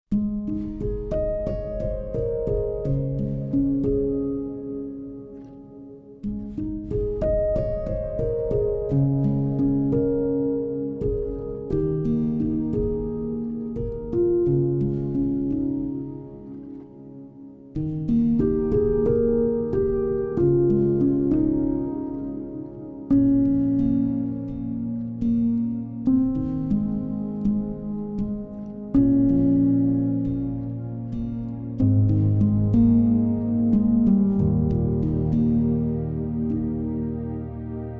Ambient Textures

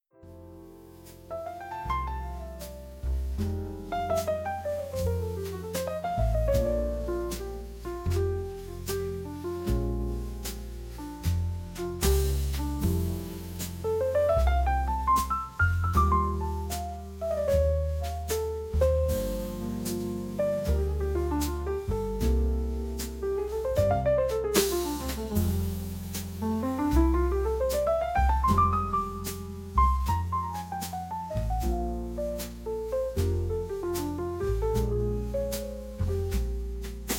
Jazz

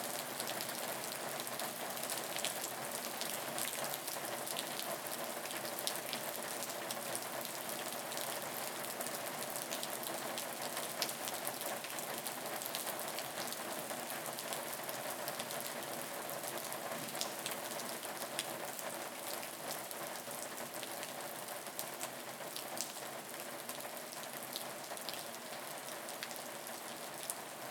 Rain Nature Medium Light Wind; Mother Earth Sounds

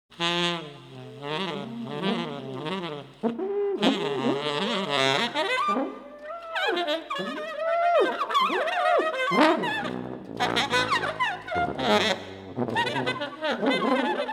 Tuba Trio; Multiphonics

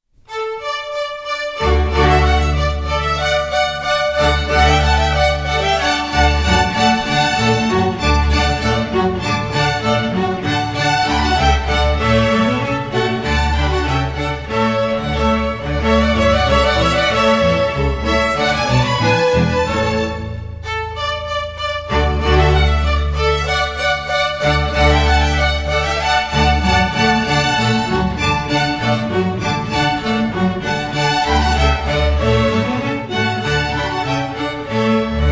CHAMBER ORCHESTRA; Royal Firework

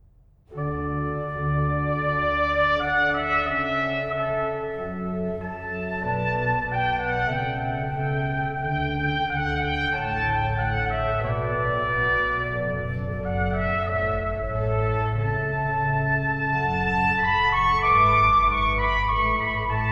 Trumpet Concerto Adagio

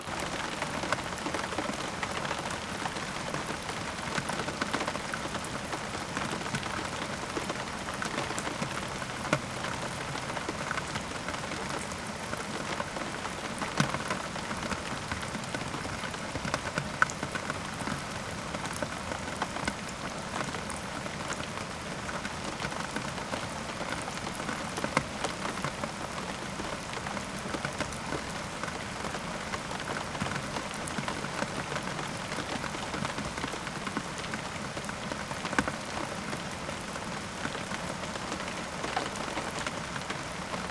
Rains Underground

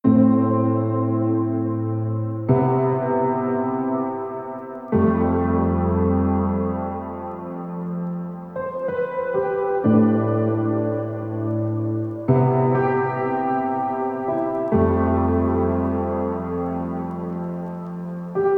DEEP HOUSE

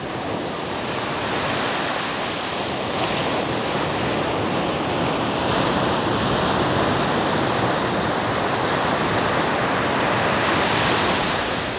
Crashing Ocean Waves

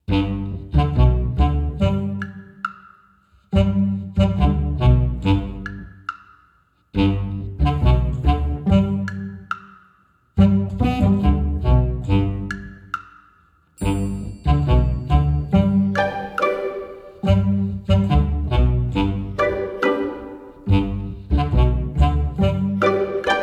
Tick Tock

